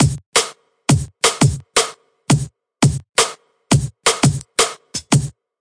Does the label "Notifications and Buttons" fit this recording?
No